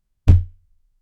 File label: black hole punch one punch